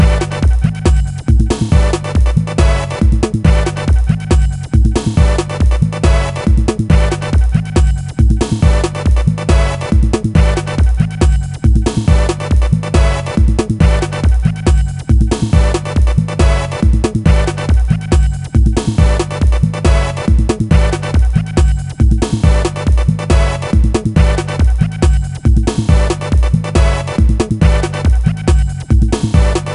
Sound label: Ditty; NBA ShootOut 2000; PS1